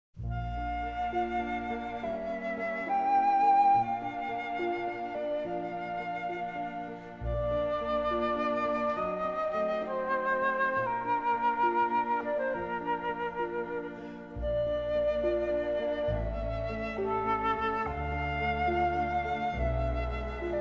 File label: Nocturne No.5, for piano in B fla